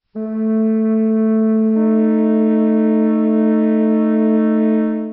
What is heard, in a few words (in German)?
flöte; VI. Annäherung dieser Intervalle durch gleichstufige Temperaturen